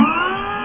Open Bad; amiga; DiamChall

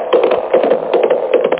HOOVES; gamesencyclopedia1995

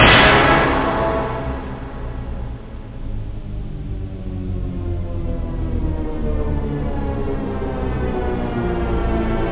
decline, stabs; da capo vol1; instruments

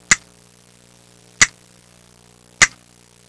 spanish castanet; Sound Effects for Multimedia - Volume 1

